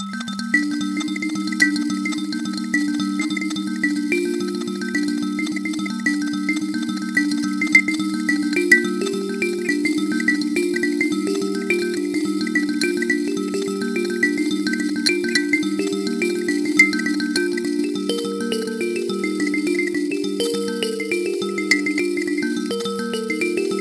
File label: Solo in G minor, Kalimba